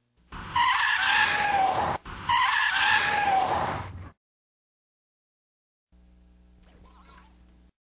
Sound Clip Tire Screech

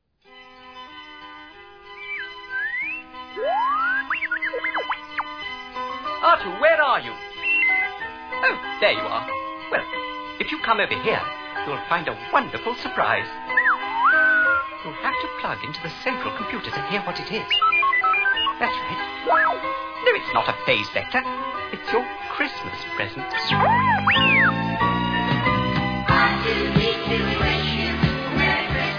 We Wish You a Merry Christmas; R2-D2

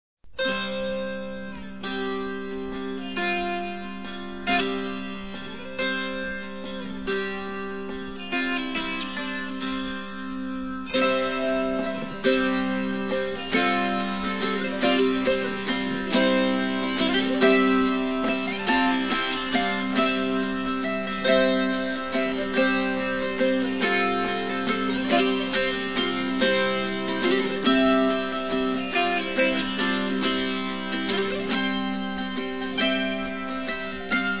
Dulcimer Duet

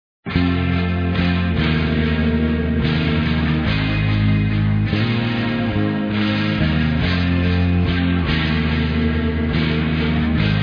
Rooster

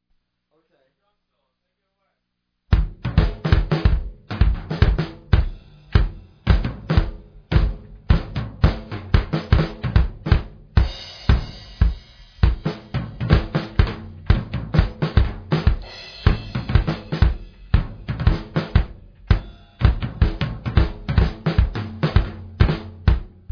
Monkey Drum Solo

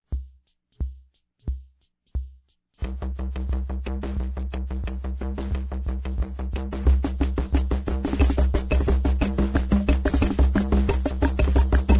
robot drum solo

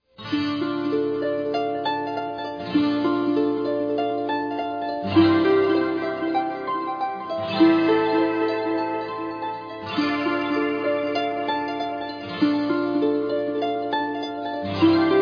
Pretty Flute Melody Instrumental; Denver the Last Dinosaur

